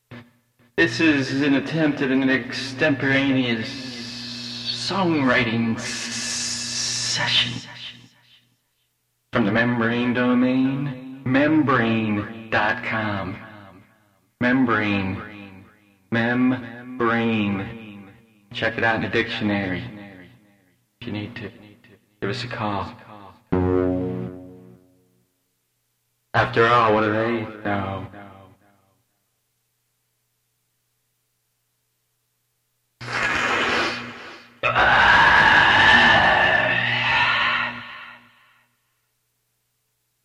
concert, onemanband with an occasional bassist

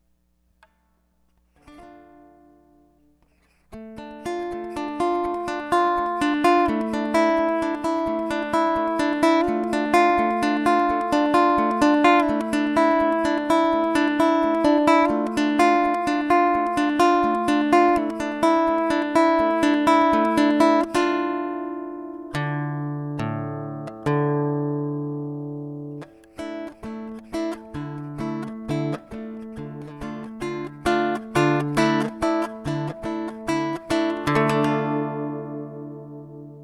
Guitar In Dm

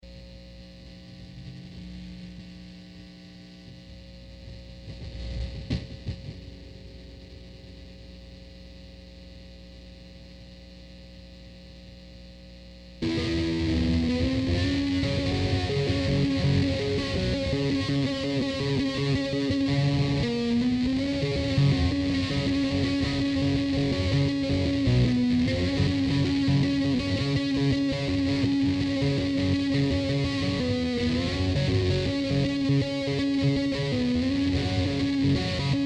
Guitar

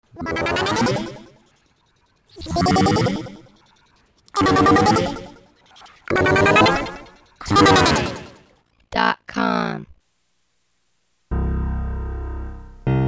sweet piano thing

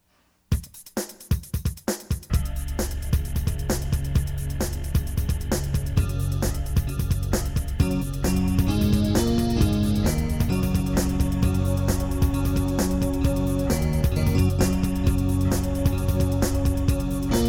Mindful Soundcheck

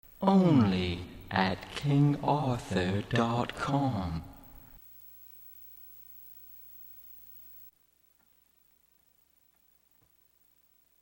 organ

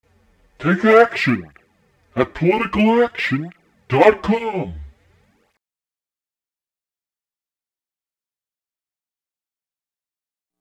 rastaman chant, live reggae

